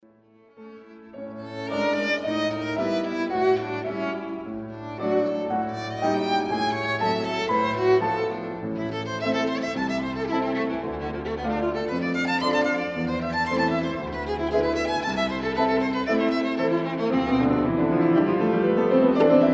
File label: viola son; bantock